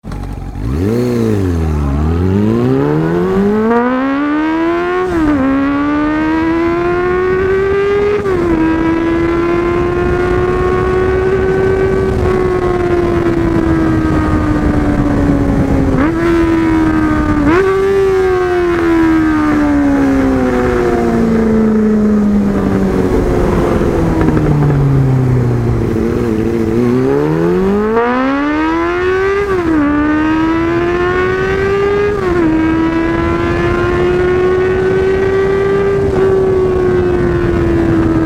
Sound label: acceleration, cb400sf